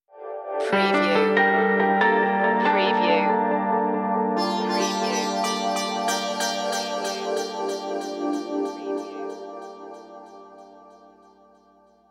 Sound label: tranquilizer stinger